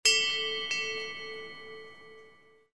Temple bell, notification; Samsung; GT-C3590

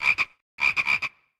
Frog

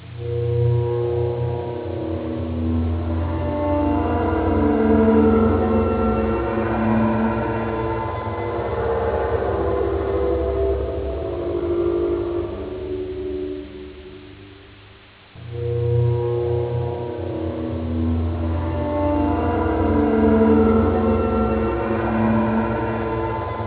Scary; i-mobile; PANO-DC5210

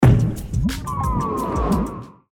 Power Off; Play; Poland

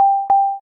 Video Stop; Kyocera; Cadence LTE

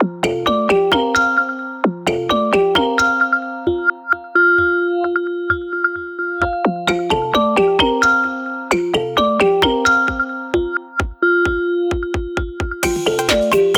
Heart, ringtones; Honor; 600 Pro China

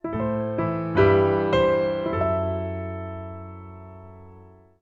Piano Poem; Meitu; M2

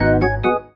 Bobble, notifications; Meitu; V4

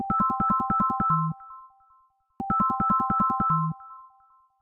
Atomic Bell, ringtones; Samsung Galaxy S24

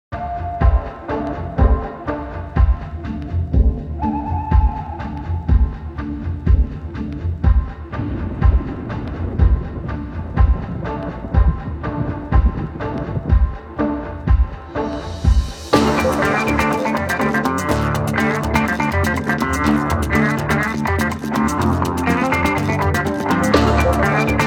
Thunder Beatz; Nokia; 3250; XpressMusic

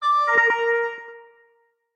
Pipe

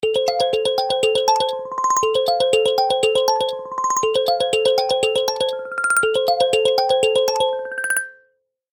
ringtones; Itel; Power-80

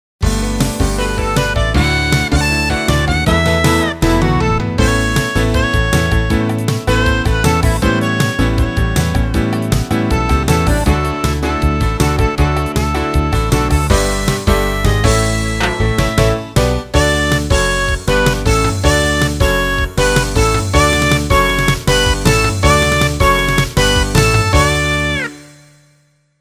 Dance

